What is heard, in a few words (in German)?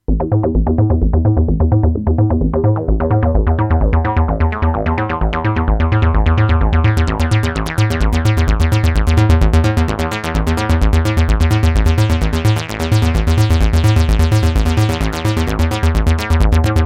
Bass Octave und Filter